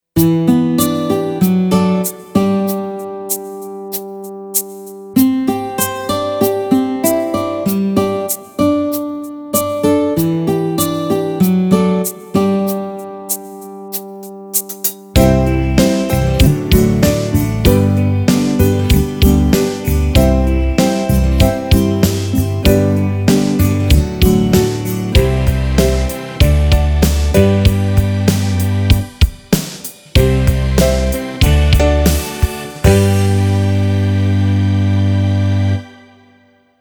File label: shallow ballad